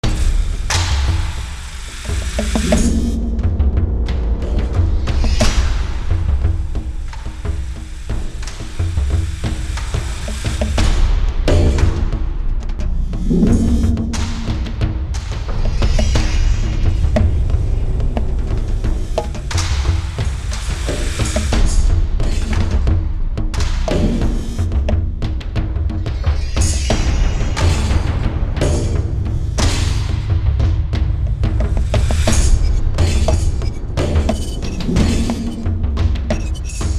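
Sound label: Battle Loop Rural Percussion; Fallout New Vegas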